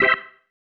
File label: Stab, Organ, One Shots